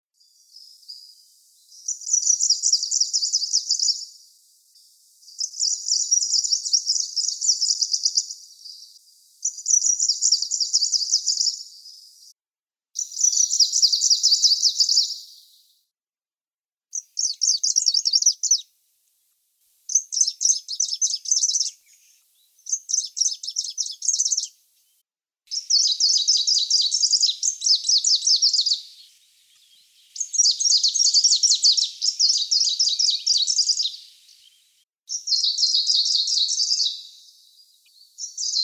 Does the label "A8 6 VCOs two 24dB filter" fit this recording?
No